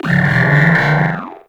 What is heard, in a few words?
Talking Machines; Ambience; Warframe; Corpus Railjack